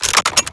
ppsh reload mag out, weapons; sven; ww2projekt

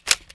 svt40 reload clip out, weapons; sven; ww2projekt

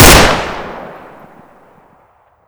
lewis shoot, weapons, ww2; sven; ww2projekt

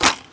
ppsh reload mag in, weapons; sven; ww2projekt

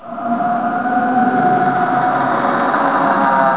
screamer die; monsters; aomclassic; sven

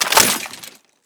lewis mag out full, weapons; sven; ww2projekt

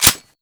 c96 bolt release, weapons; sven; ww2projekt

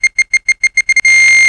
exploding

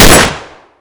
c96 shoot, weapons; sven; ww2projekt

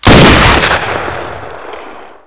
mosin shoot, weapons, ww2; sven; ww2projekt

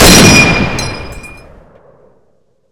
garand shoot ping, weapons; sven; ww2projekt

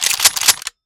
c96 clip load full, weapons; sven; ww2projekt

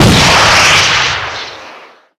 panzerfaust rocket, weapons; sven; ww2projekt

